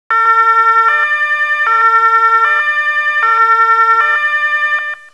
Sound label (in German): Martinshorn